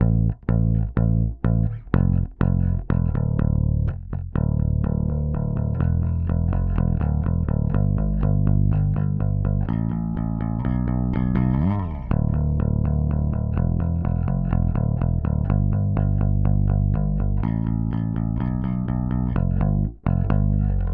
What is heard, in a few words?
CL-1B Bass; TubeTech